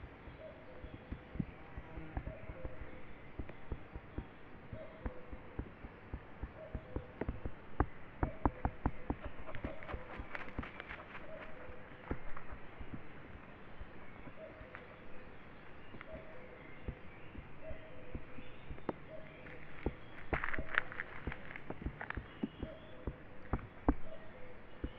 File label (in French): Foret coucou reverb naturelle; Oiseaux